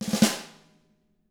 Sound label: ruff, snare; ILIO; Double Platinum Drums 1; SNARE ROLLS